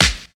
treble heavy snare drum b key, steel snare drum tuned to the B note; snare drum samples